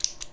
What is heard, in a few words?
hair scissors